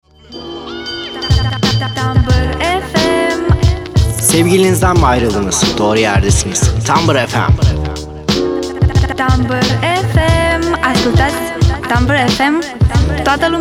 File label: Jingle; Europa